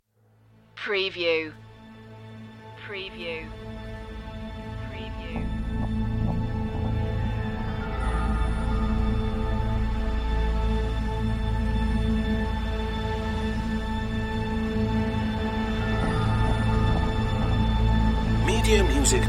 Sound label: intro drone no solo sopr